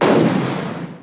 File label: enemy guns; Eagle Strike v1.01